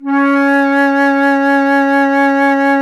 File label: FLT, WOODWINDS; Roland - LA Composer vol. 1